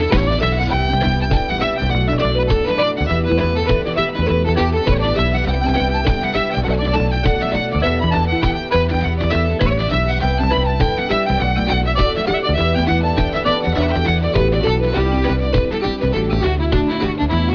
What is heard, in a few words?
jig